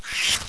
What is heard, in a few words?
Worm Attack